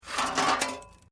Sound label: Metal Light Movement Minor